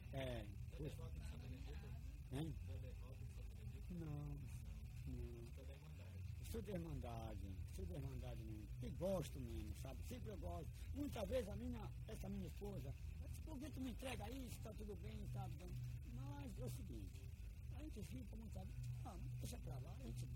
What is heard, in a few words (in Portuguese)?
seu tico carimbo sao benedito